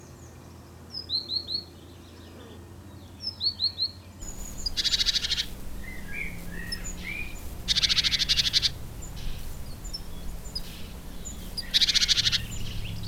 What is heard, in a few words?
verso cinciallegra parus major great tit; carbonero comun; bioacustica